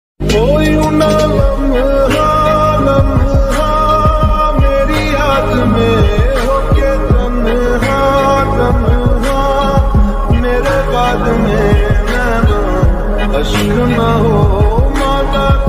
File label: ringtone slowed reverb